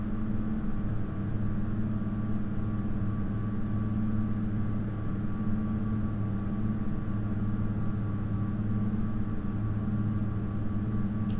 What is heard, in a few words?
Push Pull 1000 RPM